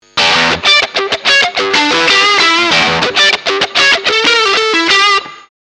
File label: Ceramic Dist High Position